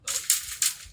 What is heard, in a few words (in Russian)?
udar po stalnomu brusu pri stroitelstve